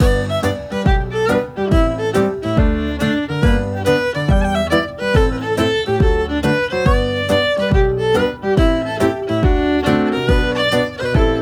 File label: Beginner Hornpipes 140